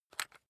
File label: rock drill head remove heavy; maschinen; bohrer; soundpool